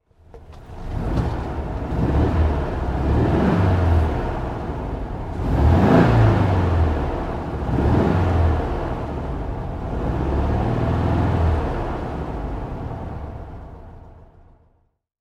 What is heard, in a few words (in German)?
VW 1300 Innen; VW Beetle 1300; VW Kaefer 1300